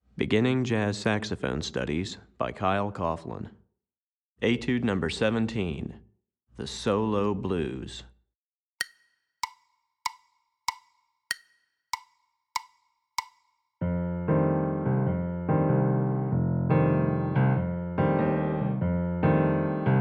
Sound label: Piano Part, Beginning Jazz Saxophone Studies Alto